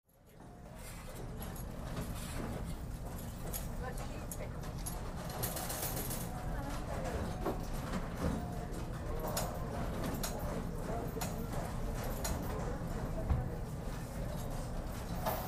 Casino Amb Near Slot Machines, Casino Ambience; Very Busy Electronic; Casino Ambience, Near Slot Machines, W Light Walla.